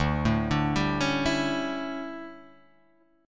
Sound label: D Major 7th Suspended 2nd Suspended 4th D down Guitar Drop D